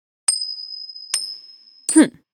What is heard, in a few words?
Bandit Coin